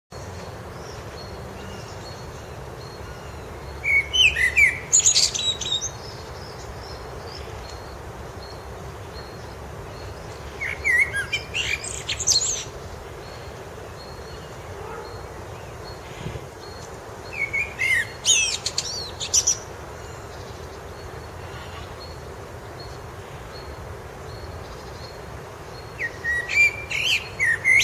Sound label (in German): Amselgesang